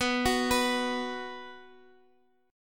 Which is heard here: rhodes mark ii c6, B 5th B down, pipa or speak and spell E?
B 5th B down